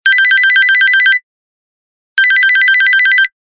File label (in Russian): rington na zvonok telefona